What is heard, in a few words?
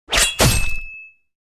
Sword swing swipe hit body